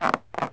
bench, church; AMBIENT; Pirates Of The Caribbean

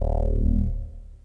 force Mod end

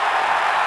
CROWD LOOP

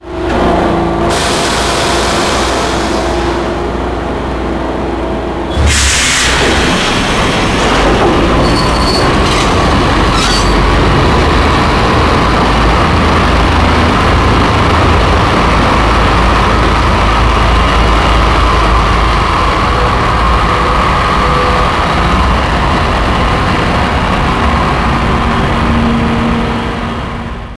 inspection wagon starts; Max Payne; subway

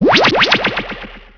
Powerup; Chase Hollywood Stunt Driver; XBOX

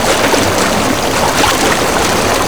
water roll, terrains; Tony Hawk's Project 8; XBOX

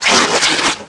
Spin, Small Spearleg, Aliens

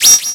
way point on

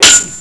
sword hit; Pirates Of The Caribbean; DUEL

